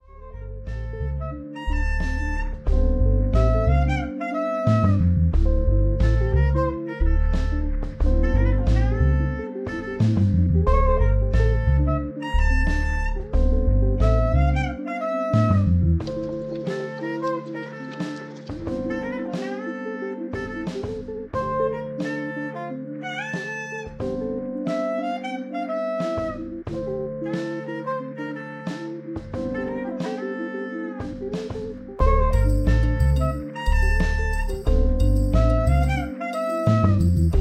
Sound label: jazz funk